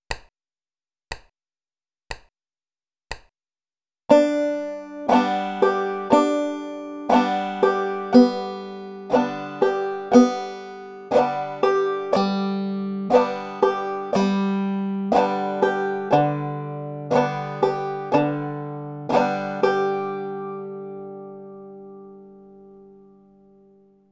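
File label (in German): bum ditty saitenklettern, banjo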